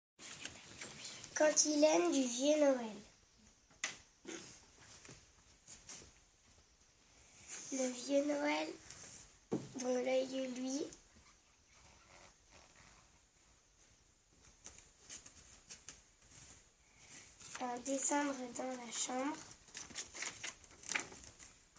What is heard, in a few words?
vocal